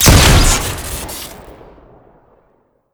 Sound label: gun shoot short crit; tf2